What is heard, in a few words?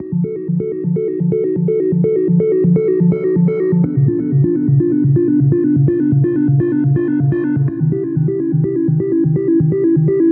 Deep 125bpm